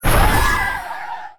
war demage

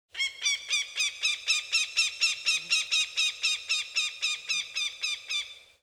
Eagle, ambience

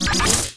Damage; PACHI; Mob

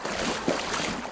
Water Run

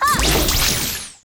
Turncoat Miler attack

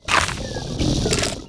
VOLCANIC GRUMBLE WAR ATTACK

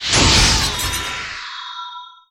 approaching

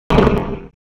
damage

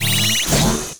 SWORD WAR ATTACK; Mob

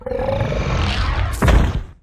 Die, Mob; Rust

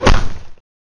Arrow Skin, Damage

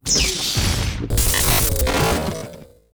Heavy Die

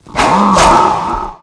WAR ATTACK

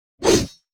sword; Weapon

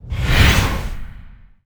Sandstorm

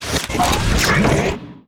guardtower die

